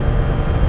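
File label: elevator floor loop; Star Wars Obi-Wan; XBOX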